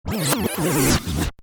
Music rewind, Rewind: Tape Effect, Fast Rewind Of Music; Tape Players (Sound Effects:Electronics)